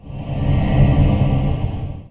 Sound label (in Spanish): magia; alquimistas